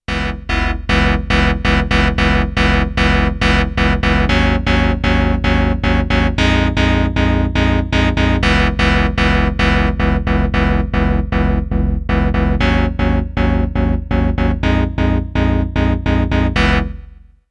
Modal Argon8

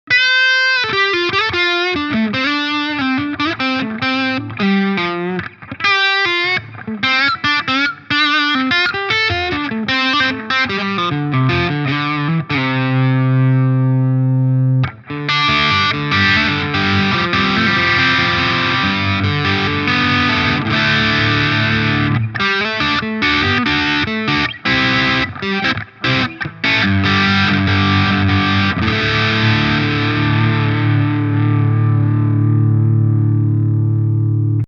VS Audio Aftermath Gain

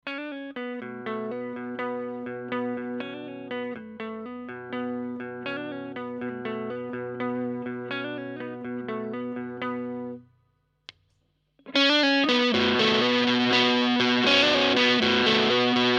EQD Plumes Mode 3

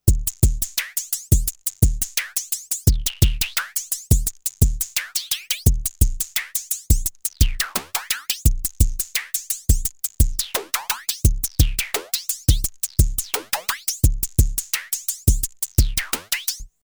matrixbrute2 beatbox

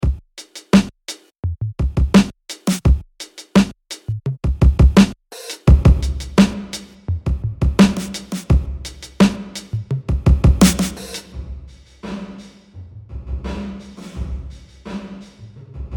Plate

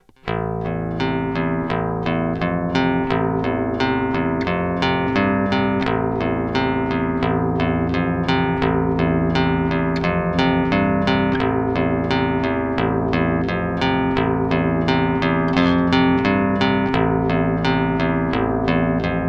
Bass cut treble boost

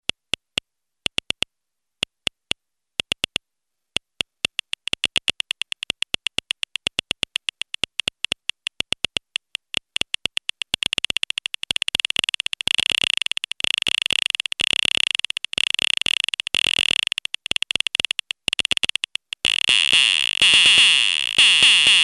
MODOR DR2 RATTLE engine

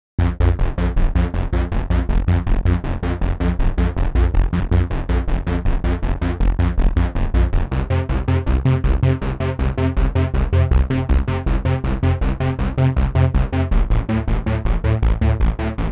Arp Bass Sequence; Volt

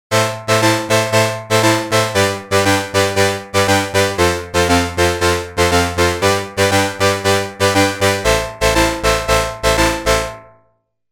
Matriarch Para4 Dance

